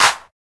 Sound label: clap sound c key; percussion; single hits one shots